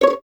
Violins C#5 Pizz; String Ensembles; Miroslav Vitous; 11 Violins

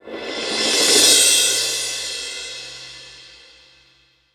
Cym Malet; Akai MPC5000

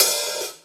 half open, Drums Percussion; Zero-G; Total Drum Bass

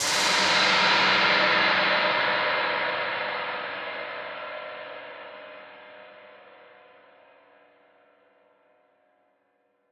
Noise Verb Decays; stereo toolkit samples

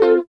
GTR -R; East West - Phat & Phunky CD2; 90's Sample CDs

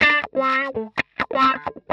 125 wah A, wahwah; PowerFX - Nu Jazz House

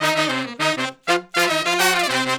Horn Section

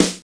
SD Real, Roland; Sound & Vision - Gigapack I

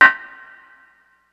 Syn Cowbell, Ensoniq ASR-X Pro

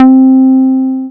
Dance Floor Bass, Multisample Basses

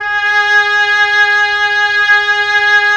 WND OB F, Oboe f; Roland - LA Composer vol. 4

